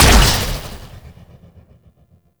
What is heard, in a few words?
Operators Amp Barrel Part C Fire; Warframe; Amplifiers